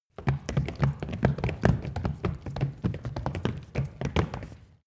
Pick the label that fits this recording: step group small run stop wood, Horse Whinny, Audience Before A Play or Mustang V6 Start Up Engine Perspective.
step group small run stop wood